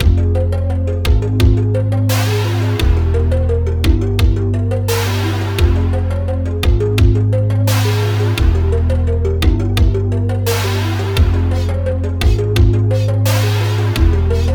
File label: 80s horror stinger